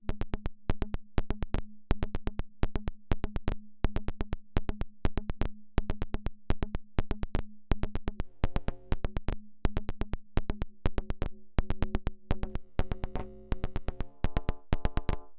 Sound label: Linnaeus Ping Mode Timbres via internal OSC shape; Rossum Electro Music